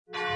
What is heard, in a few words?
kiss his